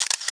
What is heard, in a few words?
camera shutter; LG